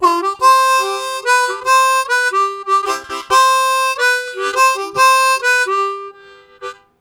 Ramp Up, Harmonica; Zero G

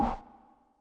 scrub, Yamaha Tenori-On; Scrubkit

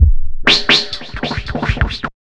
150, Big Beat Drumloops

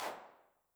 HAND CLAP Reverb, 909; classic machines

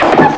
ahh; Amiga Soundtracker Sample Packs

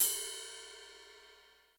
Ride, Yamaha RY20

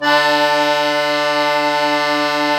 KEY Cajun, Accord Trio; Club-50 - Foundations Roland; Accordians

